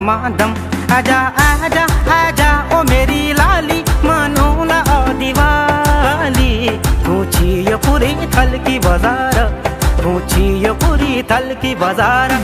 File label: kumauni garhwali song ringtone; uttarakhandi